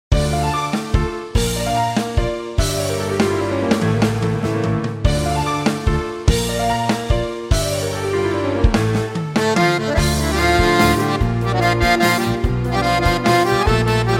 Accordion

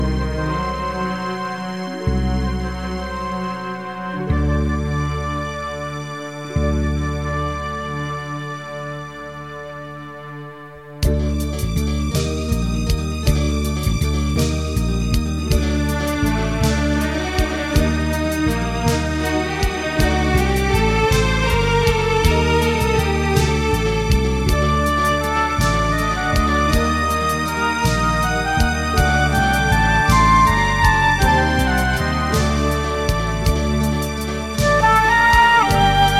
Flute Saxophone

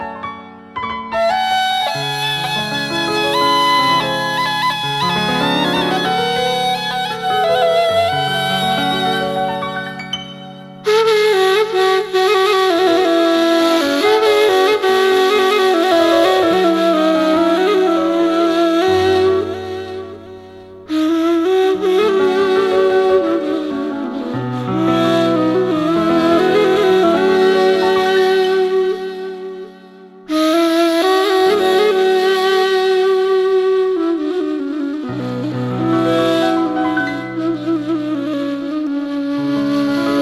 Ney

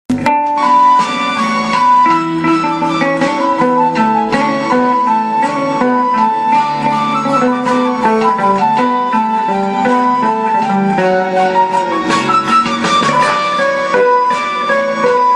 Electro Guitar

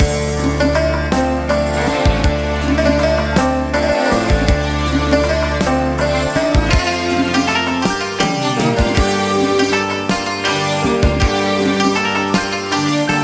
Accordion

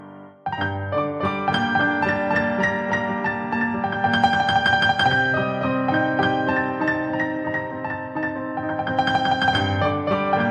Piano

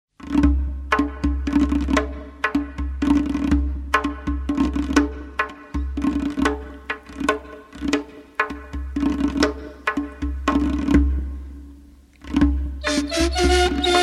Ney

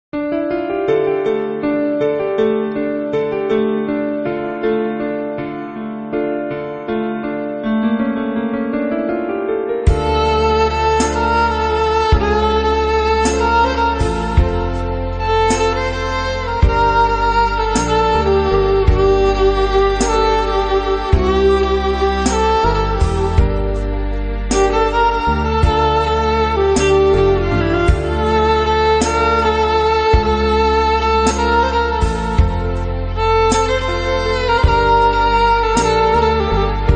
Violin

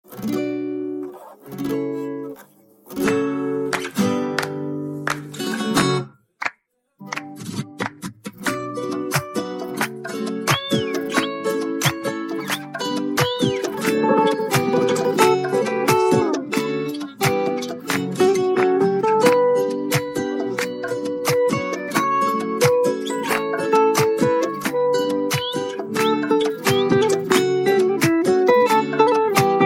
Guitar Version

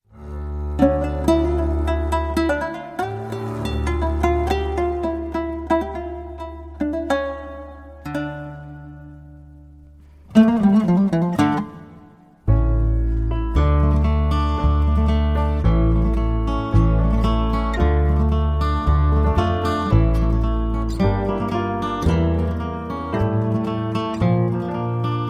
Guitar

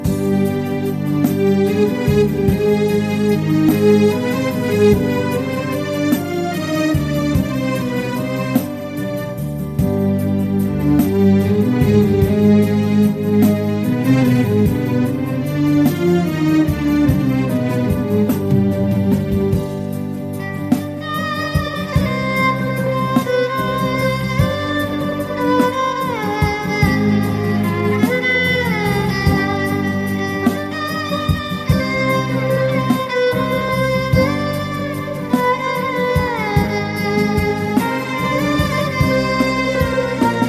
Violin

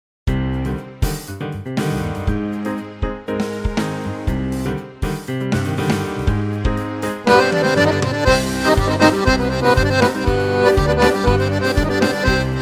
Accordion

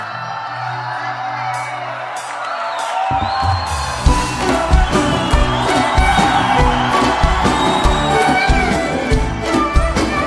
Concert, Crowd

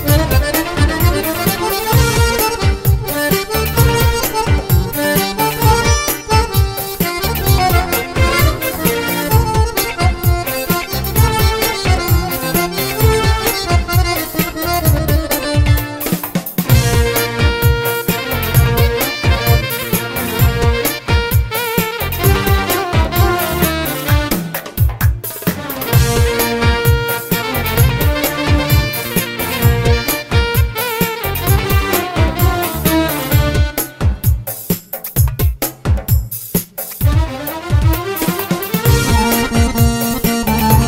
Accordion, play back

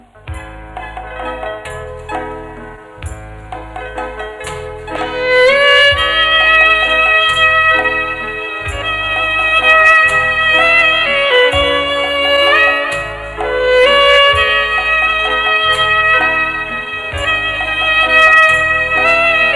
Violin

